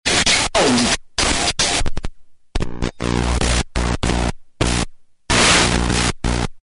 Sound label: radio broken static